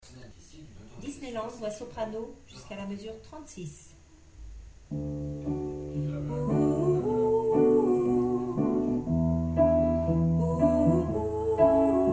2 voix f; disneyland

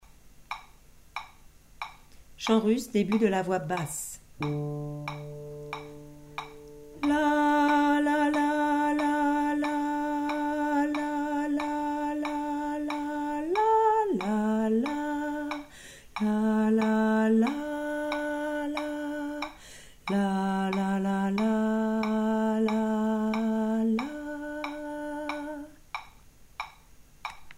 voix basse; debut de la voix basse

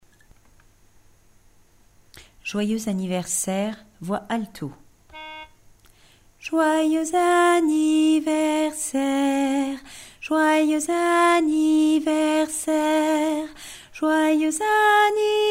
joyeux anniversaire alto basse

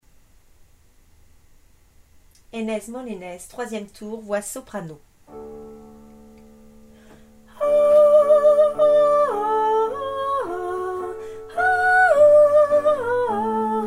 soprano

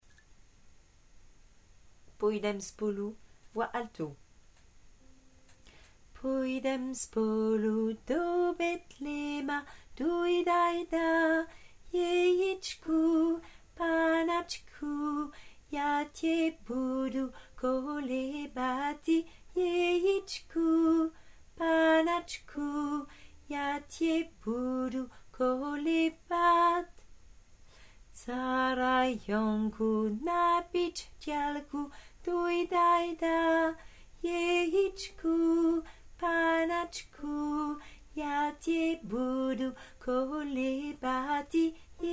alto

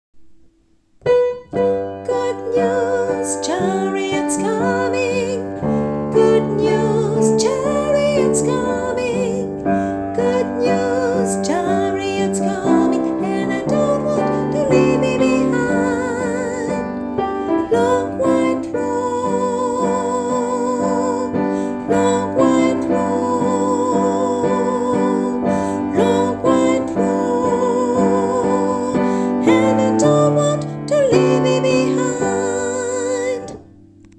tenor

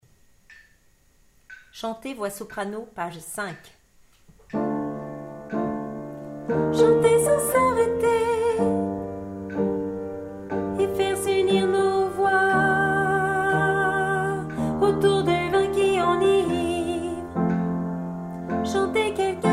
chanter soprano

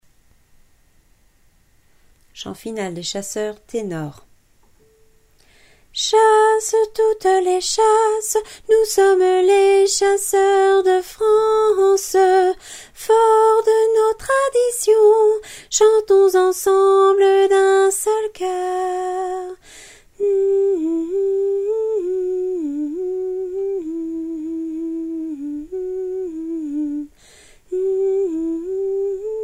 hymne des chasseurs tenor

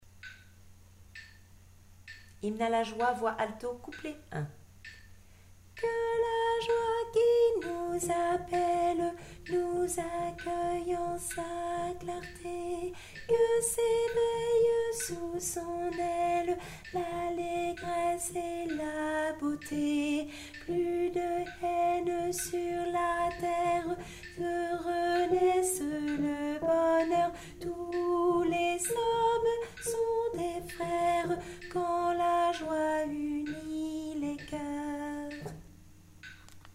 alto tempo 65; hymne la joie

